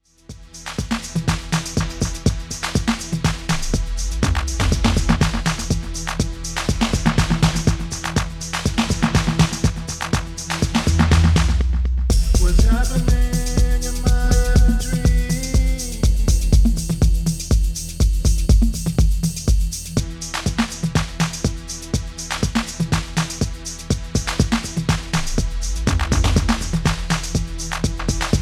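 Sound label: 400 MS Momentary Dry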